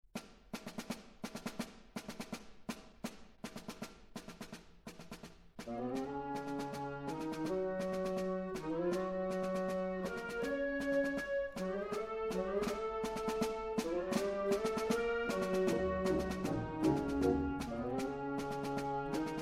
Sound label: Bolero